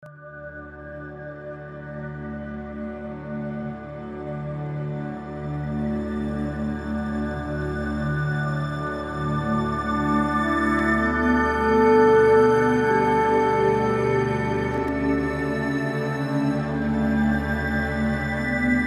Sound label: orch twist sweet dream, soundtrack